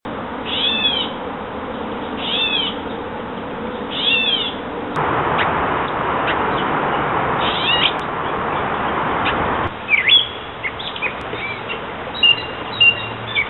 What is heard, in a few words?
catbird; Birds; Mockingbirds Thrashers